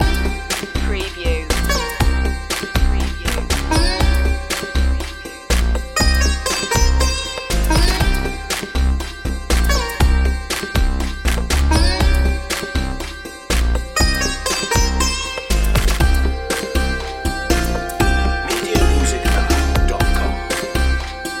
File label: bollywood pop loop